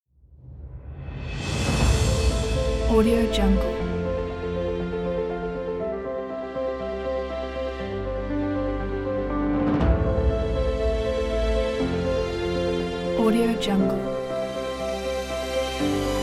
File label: inspiring orchestral